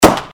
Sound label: plant shot; Blackeye